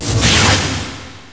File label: MISSLE